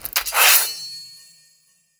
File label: warrior draw sword